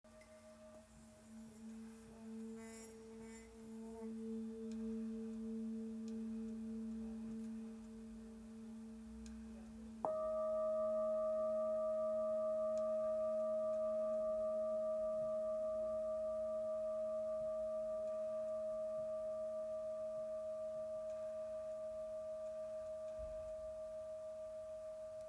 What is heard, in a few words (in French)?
Bol therapeute la